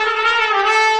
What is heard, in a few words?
trumpet danger; music